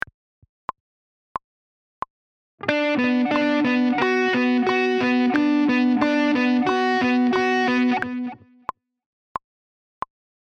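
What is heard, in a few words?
with click; Introduction To Hybrid Picking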